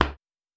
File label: Cement